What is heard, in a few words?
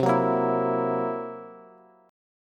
C 7th Flat 5th C down Bass; C7b5 strummed; JGuitar